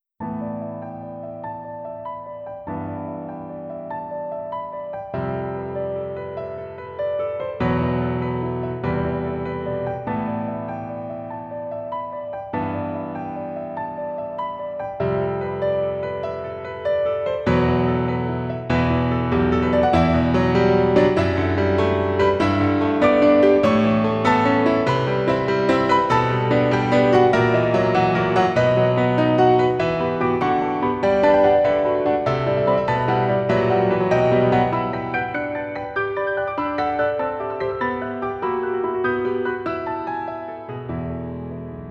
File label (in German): Klavier